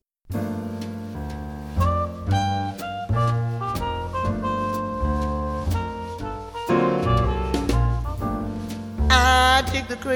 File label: jazz